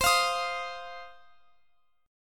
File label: C Minor Double Flat 5th C down Guitar Open F; Cmbb5; strummed